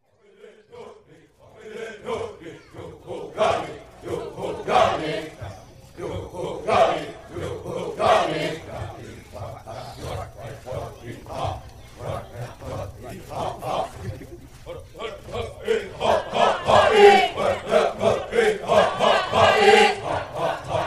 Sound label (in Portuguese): Canto coletivo da furacao de orelha